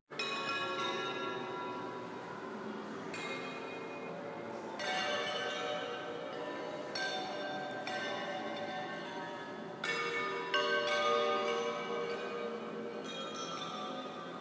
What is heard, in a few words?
Bowls on water